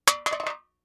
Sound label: Empty Soda Can Drop